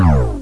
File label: Tractor Beam; Zone Warrior